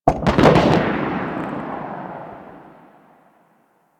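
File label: Gas Distant Cloud Explosion; Ambience; Warframe; Corpus Gas City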